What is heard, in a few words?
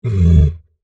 Monster Groan, Horror, Monster, Groan, Creature; Deep; Monsters (Sound Effects:Horror)